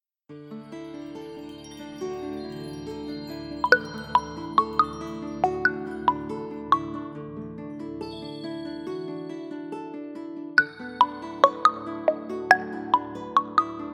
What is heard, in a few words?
breeze blow ringtone, samsung